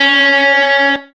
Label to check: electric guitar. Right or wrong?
Right